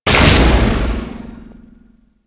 Portal Close; Crp Exterior Gln Interior

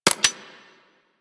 Laser Aim Rifle Alt Mech B, Weapons; Grineer

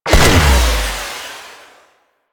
Missile Launcher Fire B; Weapons; Warframe; Railjack

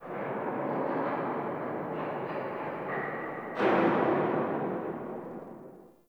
Under City Alley Cell Door Slam, Dungeons

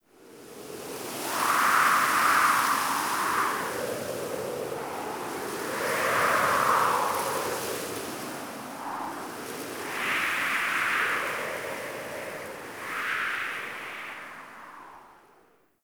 Camp Wind Gust G; Quests; The New War; Ambience; Drifter Camp

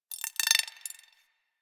Rifle Firing Stopped Mech; Weapons; Warframe; Corpus; Crp Ruban Rifle

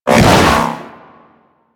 Hoverboard Ground Spin; Warframe; Vehicles; Venus; anim sounds

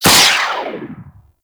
Skate Dash; Enemies; Skate Movement; Corpus Modular; Warframe; Corpus Modular Crewman

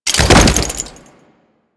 Death Body Fall; Royal Guard; Foley

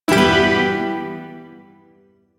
Shawzin Maj Chord C; Warframe; Duviri Shawzin

